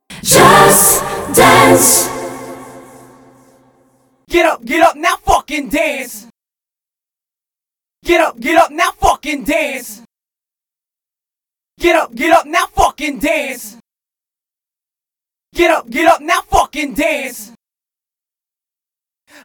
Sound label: Hurry Up (acapella)